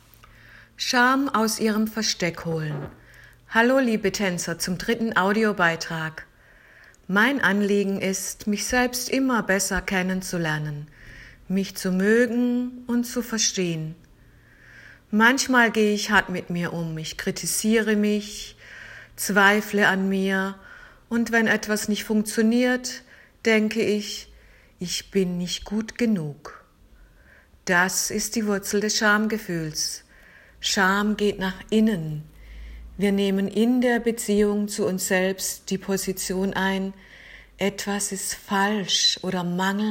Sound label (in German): Schamglocke